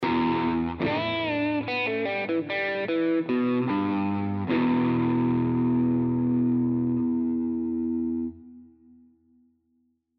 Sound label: crunch